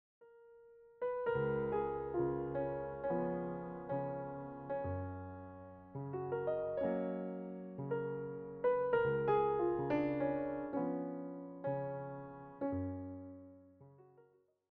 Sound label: Nocturnes In F Sharp Major, piano; chopin nocturne selections vol 1